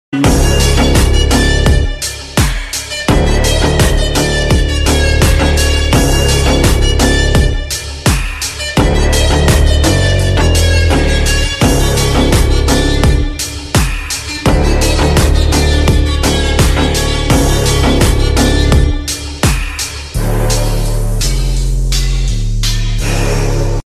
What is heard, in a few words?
Ringtone Instrumental